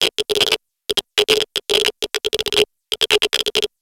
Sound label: Radiation Geiger Counter Med Loop; Warframe; Environmental Dangers